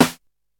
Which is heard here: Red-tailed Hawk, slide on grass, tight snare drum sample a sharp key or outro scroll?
tight snare drum sample a sharp key